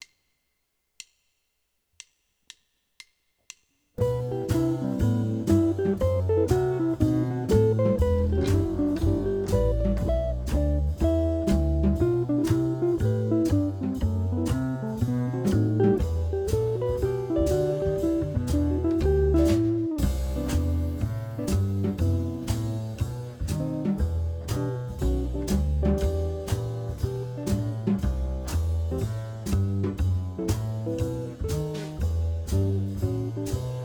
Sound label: Cmaj7 Em7